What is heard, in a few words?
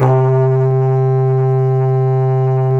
C2 HORN, Didgeridoo; Big Fish Audio; DIDGEHORN1